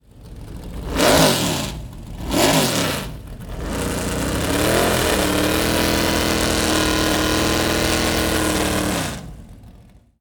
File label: Lola T163 rev